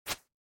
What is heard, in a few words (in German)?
schwert; Stardew Valley